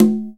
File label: conga batter; Best Service ProSamples vol.55 - Retro Sampler; AKAI